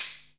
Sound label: TAMB, METAL PER; AKAI S6000 CD-ROM - Volume 1; PERCUSSION